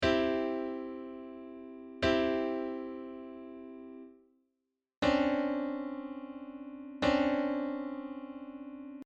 consonance and dissonance

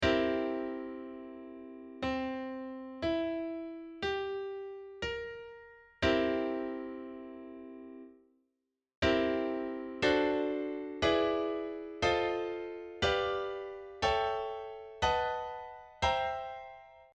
dominant seventh

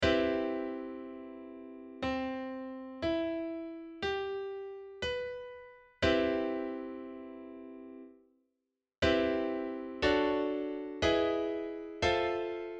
major seventh

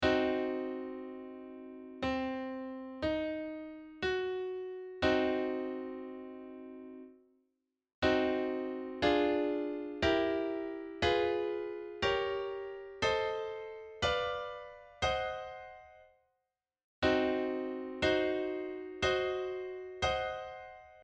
diminished

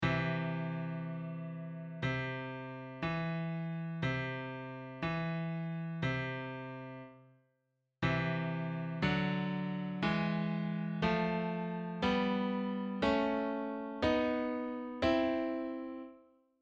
major third

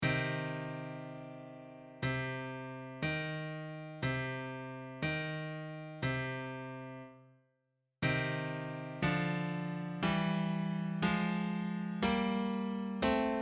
minor third

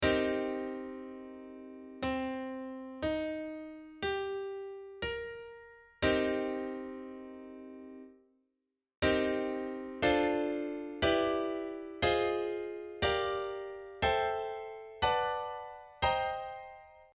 minor seventh

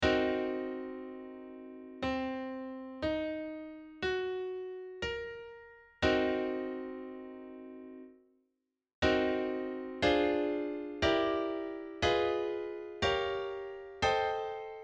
half dim seventh

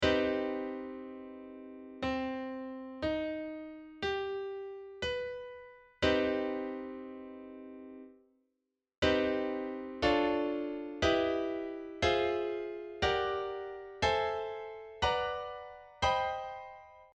minor major seventh

